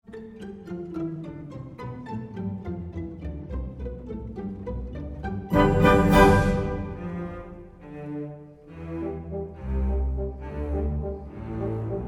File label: piano